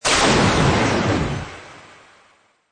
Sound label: Magical Laser Shimmer Shoot, Magic sound of casting spell; Magic sound of casting spell, conjuring or wizardry. Witchcraft ritual summoning elemental or oth